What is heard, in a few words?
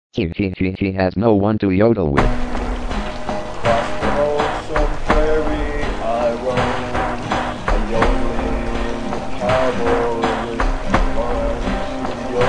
yodel